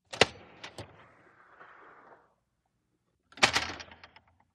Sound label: Push Bar School Door